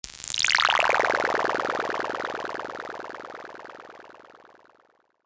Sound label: russian filter bp stereo